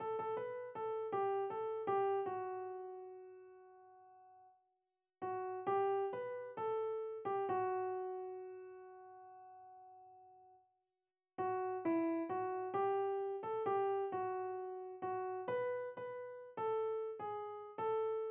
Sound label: SATB PIANO Soprano